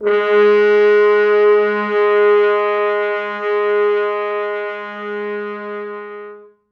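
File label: G#2, FrHorns soft mutes; 4 FrHorns; Quantum Leap Horns Sax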